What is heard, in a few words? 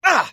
pain; chekov